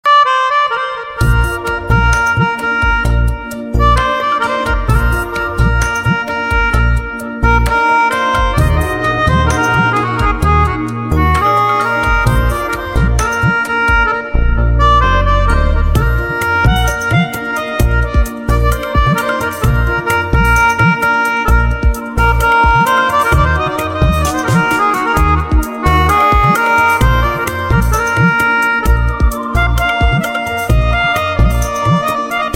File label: Pianica Instrumental Ringtone